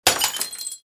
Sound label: Glass bottle drop onto concrete ground